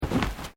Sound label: FOOTSTEPS TABI TATAMI MATS STEP; Footstep Foley; Human